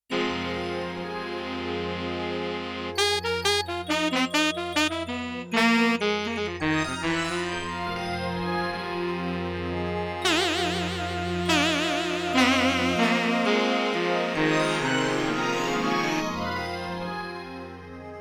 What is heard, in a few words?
Synth-Real Atmosphere; Europop Performance 1